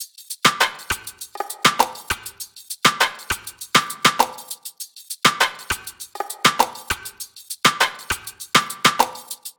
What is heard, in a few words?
top loop 100; Pop; Drums; Loops; Slate; SD PASADENA Pop Sample Pack